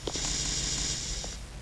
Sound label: doors